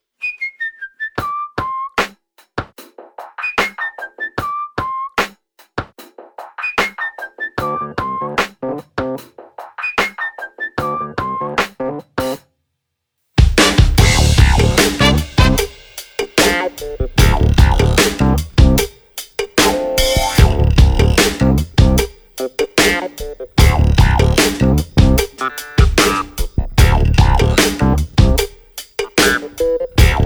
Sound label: Instrumental